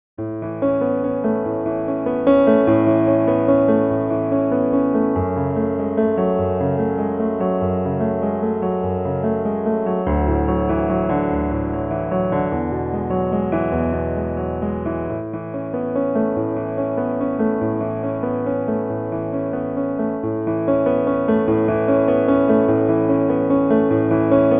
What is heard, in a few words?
New Age Piano